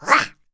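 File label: MON ATTACK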